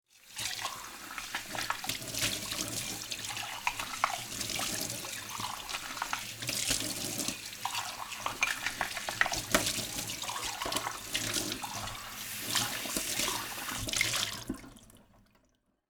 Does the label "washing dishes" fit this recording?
Yes